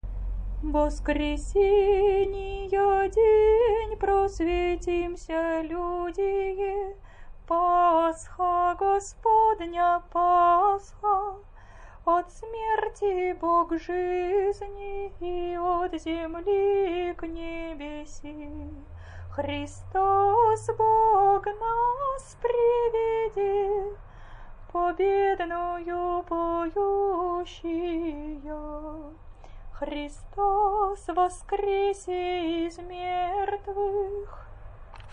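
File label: easter canon byzantine hirmos slower, singing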